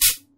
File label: Minimal Perc; Minimal Percussion